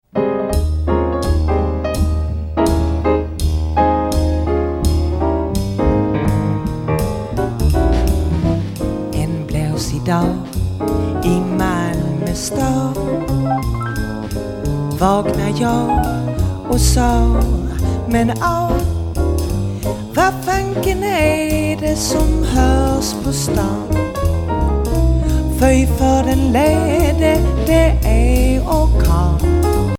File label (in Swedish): Jazz